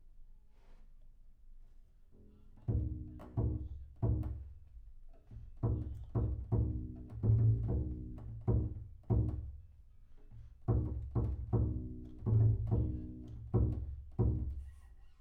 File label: Bass Room